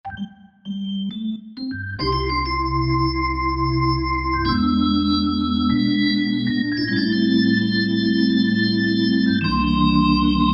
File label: Hammond Organ 16 & 1; synthesizer; Guess the synthesizer game